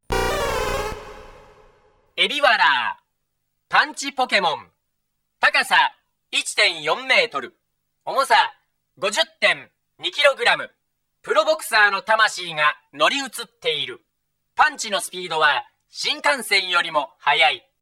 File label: hitmonchan, Pokemon Gameboy Sound; Pokemon Gameboy Sound Collection